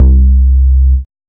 Solid Sub Bass; Big Fish Audio; Old Skool House; OSH Bass

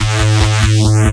Reese; neuro; BIG5AUDIO

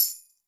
shaker, Zoom MRT-3B

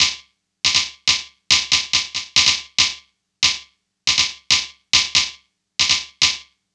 NRG Percussion, Trance, Drums; Sonic Foundry (Sony Creative Software); Trance NRG VOL-1

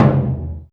Burundi dry, Heart of Africa; Spectrasonics

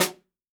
jungle snare chromatic, real drums

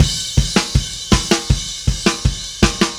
Happy Pub Beat Crash, Indie Rock; Sonic Foundry (Sony Creative Software); Indie Rock Anthems; Session 05 - Happy Pub

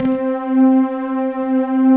PLUCK PDS; Pro-Rec - Synthsonic; TR PADS

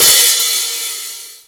HH OPEN; USB Soundscan vol.27; Ragga & Dub Reggae; AKAI

